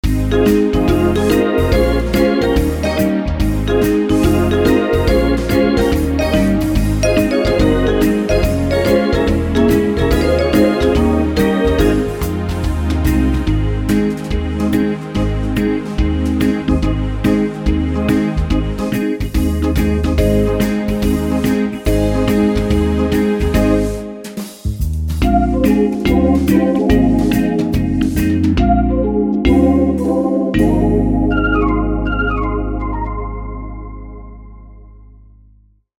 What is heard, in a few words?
organ swing; wersi